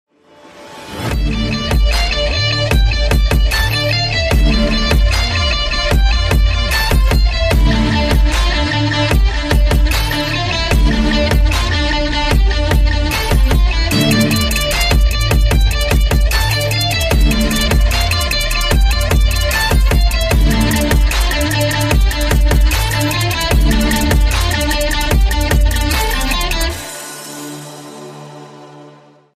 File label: trap